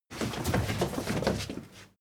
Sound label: crowd stand up